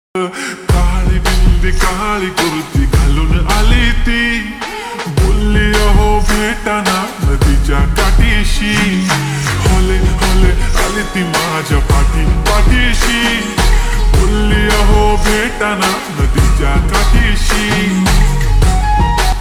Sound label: Ringtone Slowed Reverb